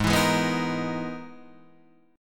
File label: E-Major Sharp 11th G sharp; EM#11/G# strummed